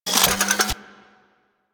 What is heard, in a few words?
Heavy Grenade Launcher Reload; Warframe; Archwing